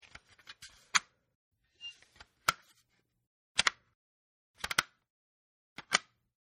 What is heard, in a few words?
CD Fat Case Close, Cd Dvd Regular Fat Non-Slim Jewel Case Close; Cd Dvd Regular Fat Non-Slim Jewel Case Close-5 Takes; Snaps; Snap Sound Effects; Objects